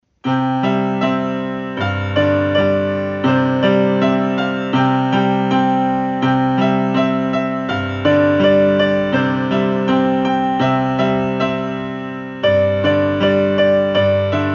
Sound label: piano